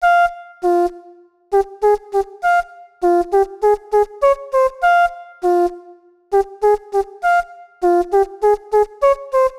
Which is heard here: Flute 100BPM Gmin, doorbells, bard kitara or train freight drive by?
Flute 100BPM Gmin